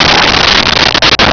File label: asteroid beam start